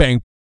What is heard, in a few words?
PERC, Vocal, Jazz FX, BASS PERC